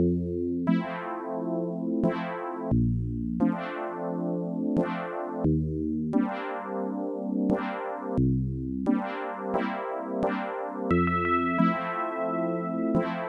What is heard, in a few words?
ROMANCE